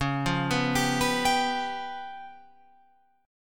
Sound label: C sharp 7th Flat 5th C sharp down Guitar Open F; C#7b5; arpeggiated